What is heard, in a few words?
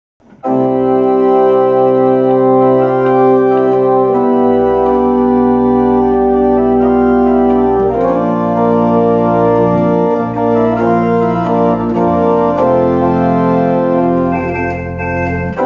Jazz Pop